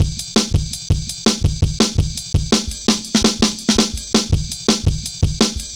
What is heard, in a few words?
Jazz Ride A, Drum Loops, 166.5; Jungle; Zero-G Jungle Warfare 1, 2 & 3; 90 s Sample CDs